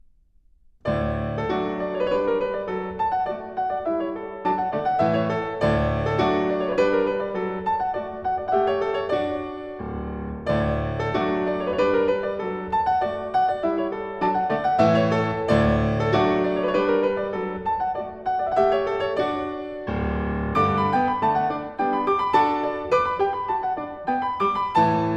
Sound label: Halling; Little Suite for Piano and String Orchestra; Ole Olsen; 1927